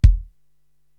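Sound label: bass drum Dry, Korg TR-Rack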